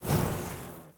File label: Burning Hands Whoosh Tails